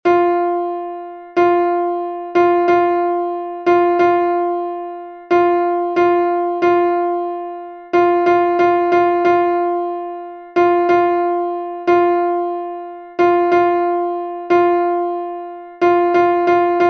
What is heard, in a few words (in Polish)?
temat rytmiczny